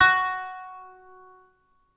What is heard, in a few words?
kanun